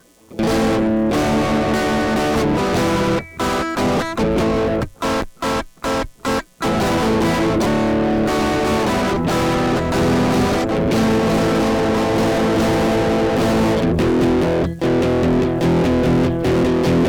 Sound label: Marching electric